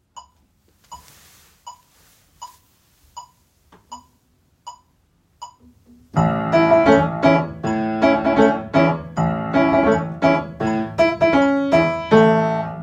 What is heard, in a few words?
Accompaniment; Annie Audition Songs